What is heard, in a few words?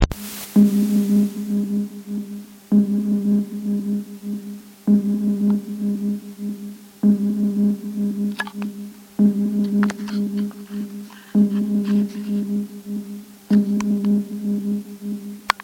3 Osz; Attiny13